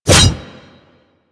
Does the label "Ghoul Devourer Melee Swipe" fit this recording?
Yes